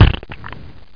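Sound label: squash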